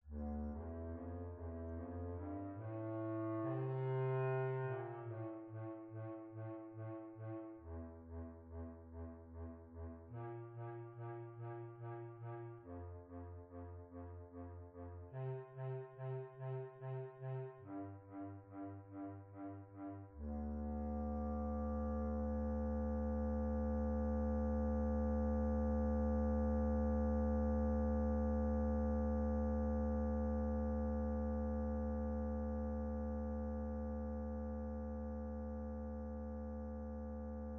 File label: Bass Clarinet Excerpt